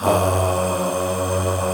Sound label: POP AAHS